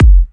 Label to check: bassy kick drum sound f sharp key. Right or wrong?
Right